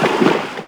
water splash small; WAR

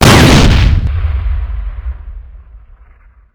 105mm shot

